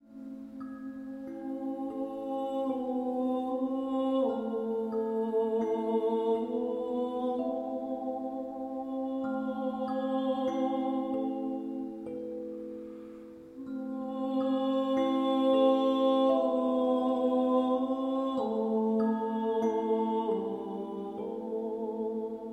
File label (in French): Voix et sansula